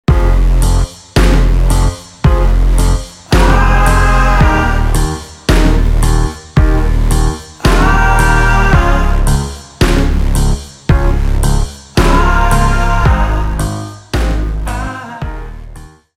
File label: lose; radiant; dota; stingers